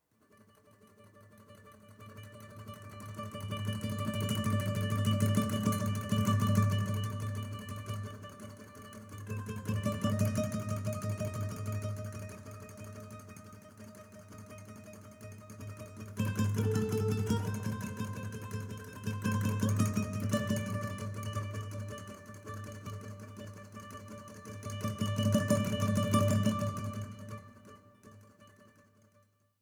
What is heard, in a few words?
Savannah Music